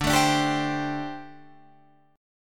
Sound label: D 7th Suspended 4th, down, Guitar, Open F